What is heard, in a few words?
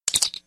Comm badge